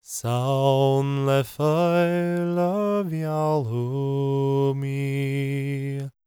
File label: GAELIC, World Voices; ILIO; Vocal Planet